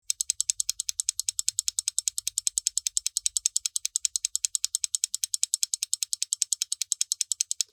Bomb analog ticking timer; Explosions

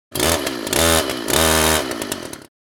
Chainsaw revving short

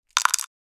Pill container putting pills inside; medicine

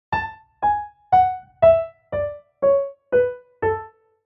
Piano A major descending scale

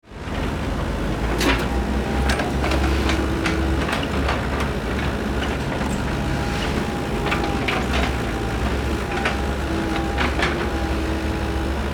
Excavator construction vehicle working

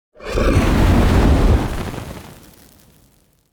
Dragon fire breath long

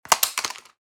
Headphones falling on surface soft impact; headphones and speakers

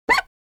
Plastic squeak and creak; gfxsounds

